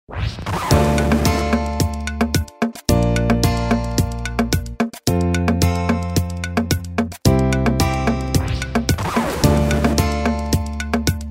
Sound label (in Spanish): Reggaeton